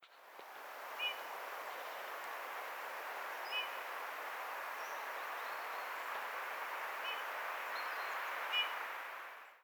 Pyrrhula pyrrhula; SETE

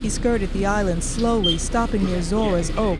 noisy

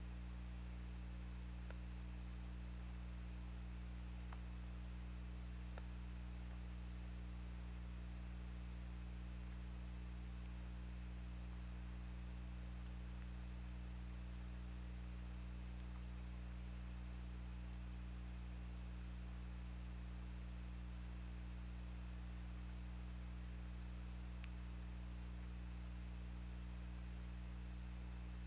Orca; OrcaLab

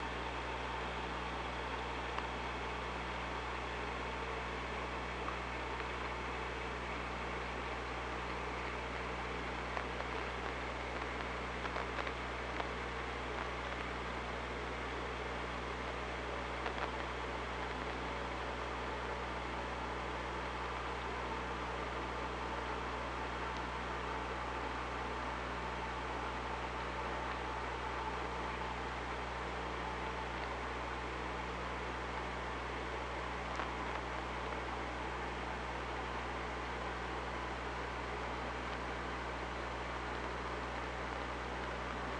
OrcaLab

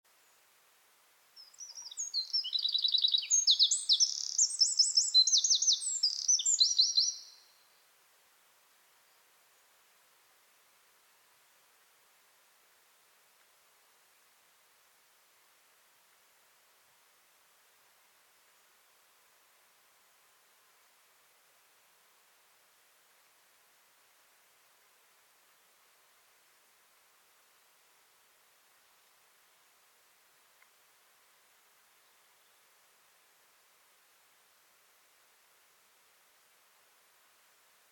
Troglodytes troglodytes; SETE

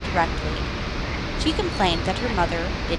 noisy

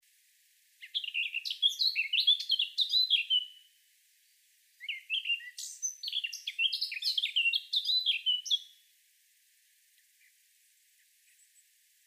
Sylvia atricapilla; SETE

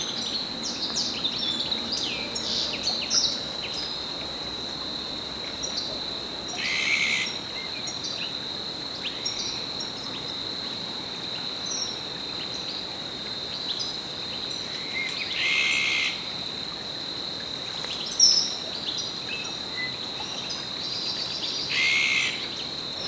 Carduelis carduelis; SETE

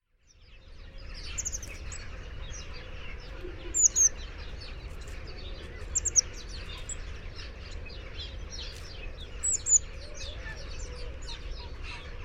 Cyanistes caeruleus; SETE

